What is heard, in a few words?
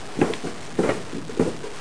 stair; PUZMANIA